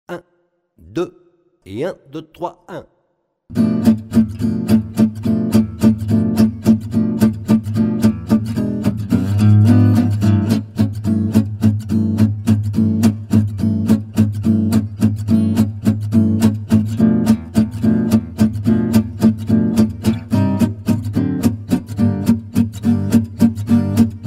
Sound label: Manouche Valse; MyVirtualBand